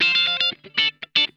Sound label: OCTALICK, Funk Guitar; Zero G - Funk Guitar